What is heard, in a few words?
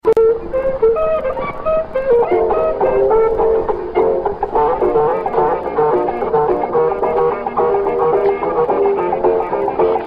Clarinet; Gateway